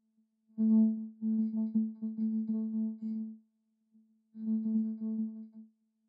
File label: vocoder